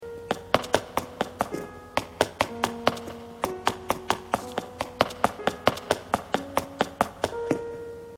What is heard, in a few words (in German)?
rennen; acnh